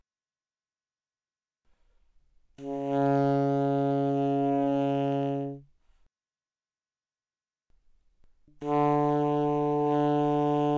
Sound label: Alto Sax No Vib pp Db3 B3, alto saxophone